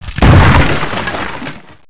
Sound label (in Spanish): madera, ruidos destruccion